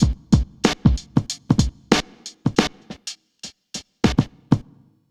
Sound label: Drums, Urban Grooves; Sonic Foundry (Sony Creative Software); Stylus Pressure Urban Grooves on Digital Wax; Summer Jam